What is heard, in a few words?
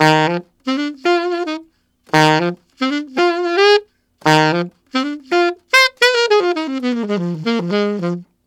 Alto Melody in F7, Alto Sax, Jazz; Sonic Foundry (Sony Creative Software)